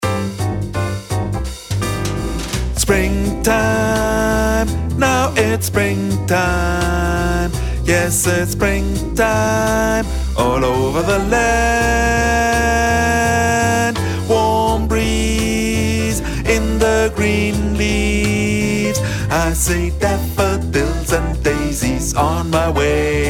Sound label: Tenor